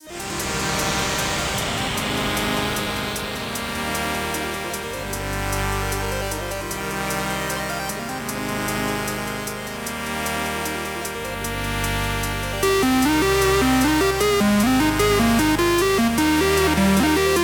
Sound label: 8bit cover